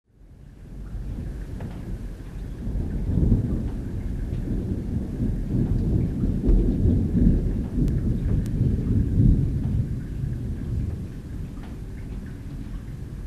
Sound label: far rumble